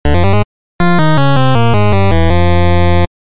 全滅 レトロ モノラル

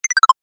キャンセル 高音 レトロ

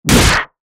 槍 通常攻撃 モノラル; 武器効果音

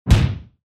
ワンドでたたく; 武器効果音

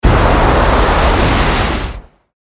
水魔法 中; モノラル; 魔法効果音

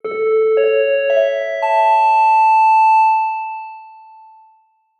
お知らせ 始まり 遅め リバーブ有り; 戏剧音效

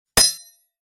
剣

